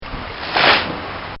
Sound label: Haybale Dropping To The Barnfloor; Buildings; Bobby Cole